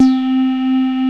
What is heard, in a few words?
CS-15; Masterbits; Vintage Voltage; LEADS